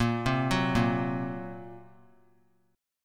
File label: Bb Suspended 2nd Sharp 5th; Bbsus2#5; arpeggiated; JGuitar